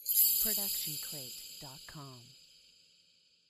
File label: Shimmer Hit; SoundsCrate